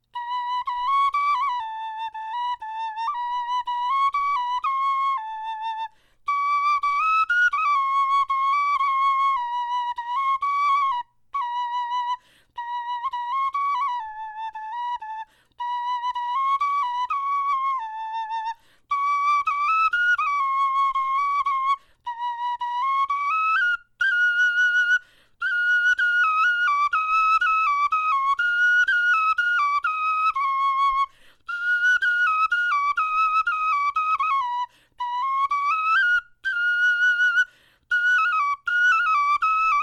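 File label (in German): melodie, Knochenflöten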